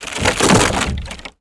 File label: objects, World of Tanks